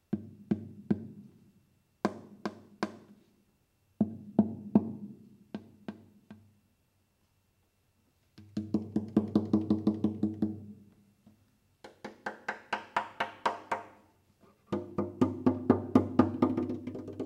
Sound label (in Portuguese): Percussão